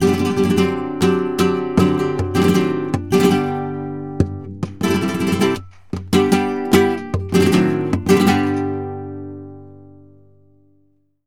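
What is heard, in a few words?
guitar, 160, ALEGRIAS, Flamenco; Best Service ProSamples vol.46; AKAI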